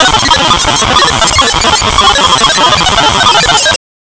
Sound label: space radio